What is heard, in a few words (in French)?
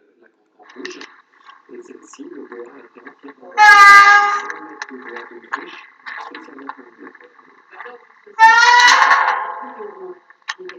PORTILLON; ST MARTINLM